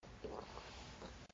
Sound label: Suck; Lewd SFX